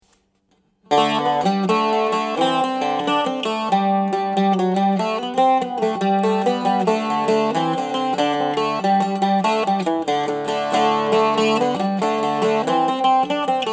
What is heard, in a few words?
woods, mandolin